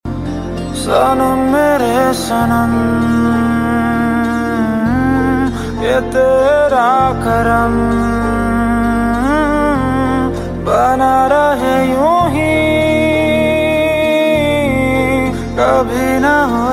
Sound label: Unpluged